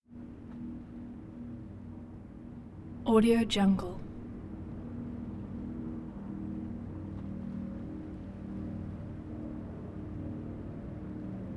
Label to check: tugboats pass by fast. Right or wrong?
Right